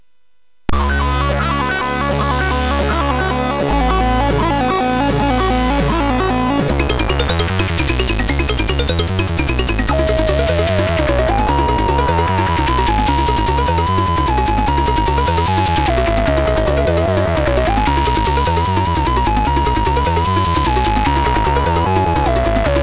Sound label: Music Demo Version, MOS6581R3; hvsc